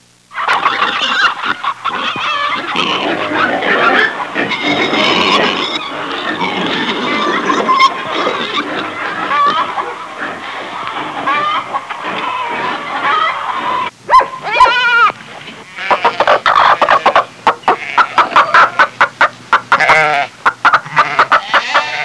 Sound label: BARNYARD; FARM